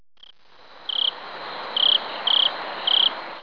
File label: cricket animal